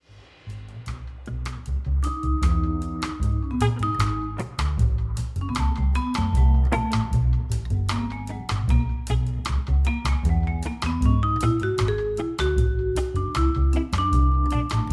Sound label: longer vibes solo